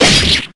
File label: weapon hit axe